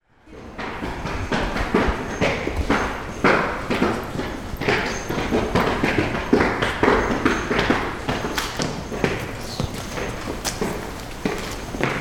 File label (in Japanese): kakeagaru asioto; かけ上がる足音